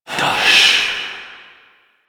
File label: Fire Whispers; Warframe; Tenno; Grimoire